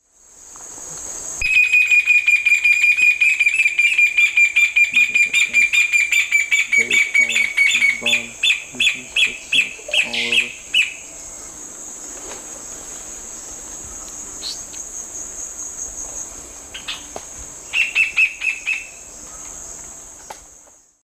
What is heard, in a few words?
Furnarius torridus, song and calls; S. America; Eastern Lowlands